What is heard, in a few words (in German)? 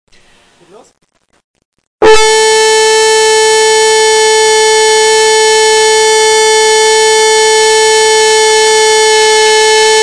fluegelhorn, ton a